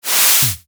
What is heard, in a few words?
speedline swipe